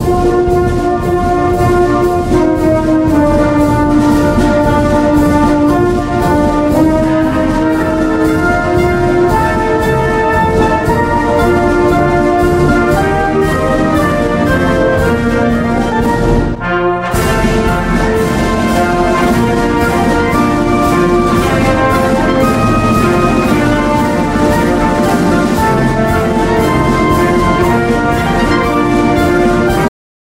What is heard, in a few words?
wand ringtone